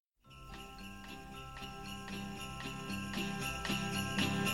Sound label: Jingle Bells